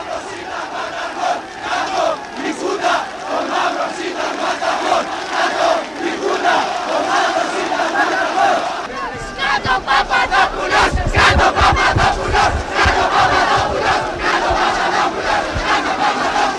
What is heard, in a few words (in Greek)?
συνθήματα